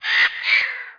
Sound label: VULTURE; outlaws